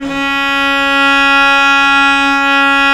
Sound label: VL C#3, VIOLE, Historical Instruments; USB Soundscan vol.23